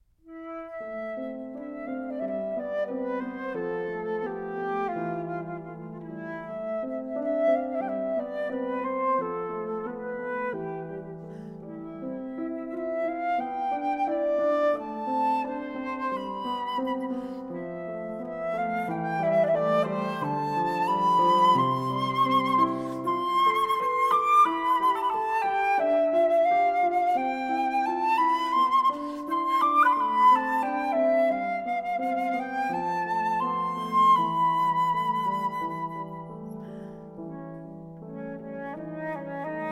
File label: humming song; Dances for Davia II